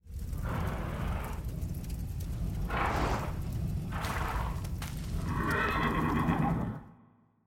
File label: fallen steed idle, monster